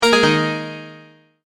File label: multimedia tone end